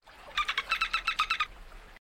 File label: dolphin with water